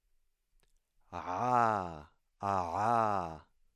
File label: voiced pharyngeal fricative